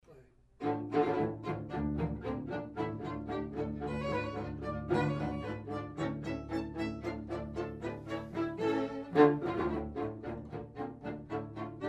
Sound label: octet